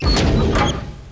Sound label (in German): Lexikon Auf